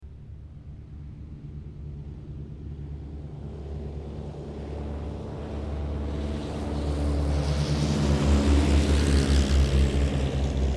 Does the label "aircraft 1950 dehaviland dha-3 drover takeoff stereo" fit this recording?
Yes